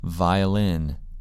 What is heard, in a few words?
violin